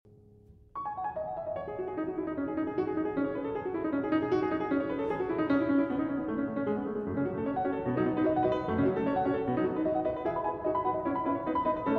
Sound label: Pour le Piano, Toccata; Debussy